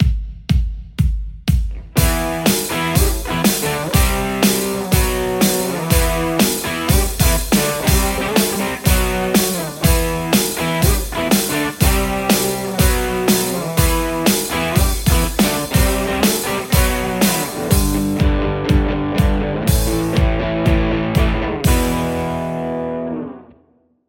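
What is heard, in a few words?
Telecaster; ronald revolutionz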